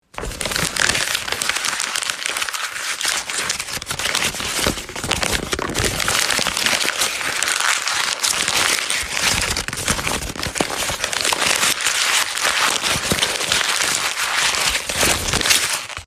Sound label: Crumpling Paper